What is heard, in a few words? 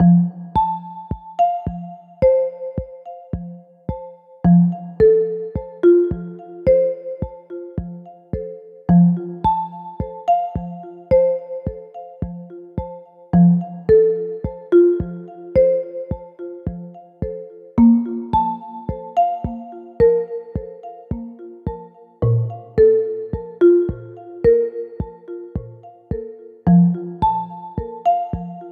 gentle jungle, chiptunes